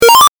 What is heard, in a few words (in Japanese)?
8bit 獲得